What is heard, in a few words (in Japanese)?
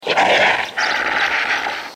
beast nakigoe